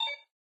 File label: Menu Click; gamerip; bejeweled 2 deluxe